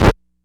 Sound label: Vinyl Record Stop Lift Needle